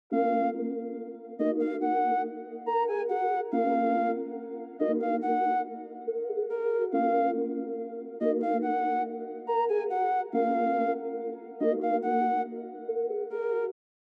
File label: Melody